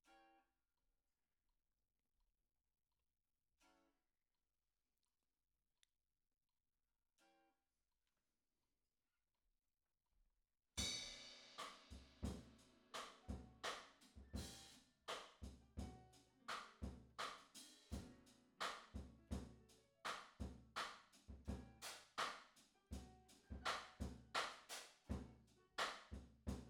Bass

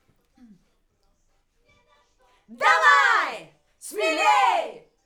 Backs AKG

